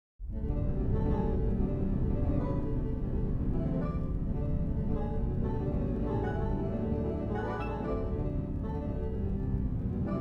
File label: fast ripples